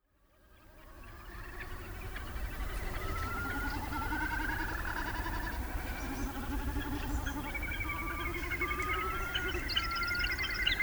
filter off, dawn; Stafholtstungur; midsummer recordings were made around four o´clock in the morning 15th of July

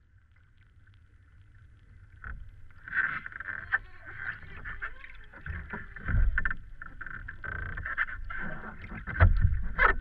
vam pottur, Hot tub; In Iceland it is possible to find many hot springs all over the country

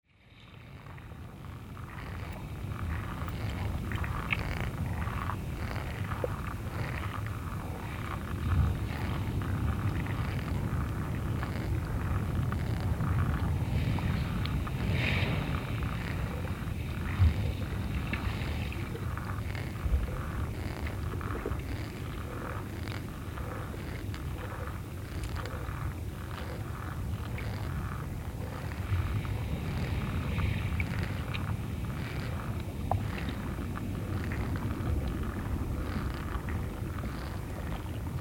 seapool tenerife; Recorder: Sound Devices 744 (24bit / 48Khz); Mic: Aquarian H2a-XLR; hungry snails scratching the pool surface; Short version

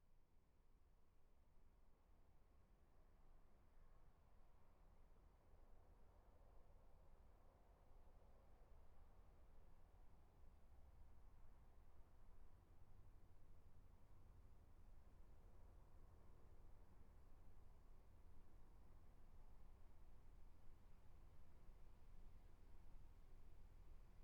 earth wind and fire

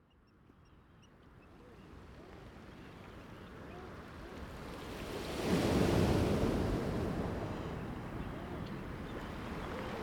ocean day; World Oceans Day 2018; World Oceans Day takes place every 8 June

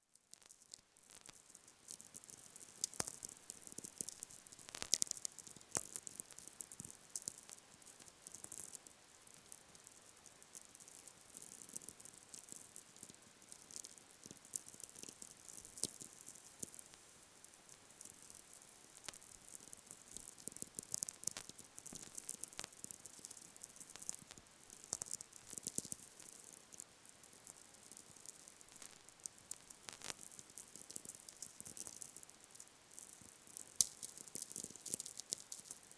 Space weather over south east Iceland; auroral chorus

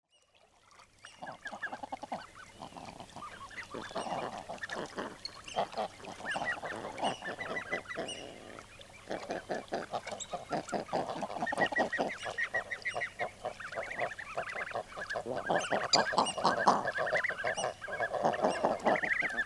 common eider

